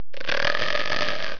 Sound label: zombie attack; svencoop; schall